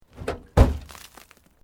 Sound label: Metal Light Movement Minor